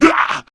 Pain, Male; Warframe; Grineer Male B; Battle Barks

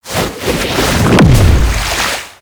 Ultimate Focus Operator Cast; Vazarin; Ultimate Abilities

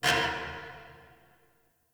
Parking Lot Metal Door Distant

